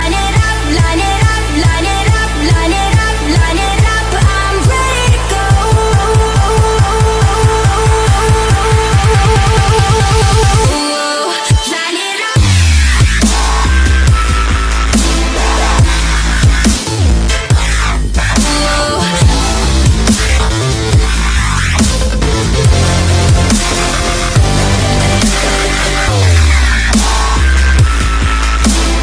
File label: dubstep